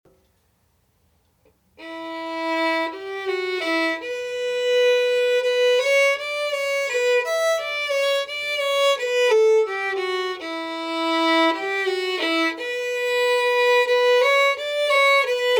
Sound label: Crested Hens